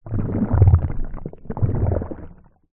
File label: human stomach gurgle hungry or ill